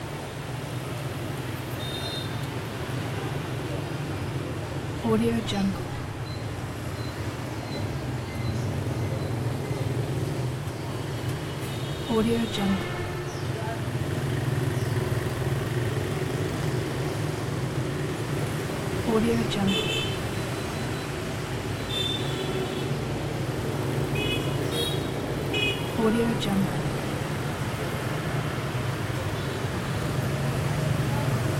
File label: ambiance traffic busy vietnam hanoi loop